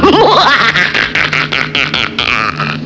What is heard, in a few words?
evil laf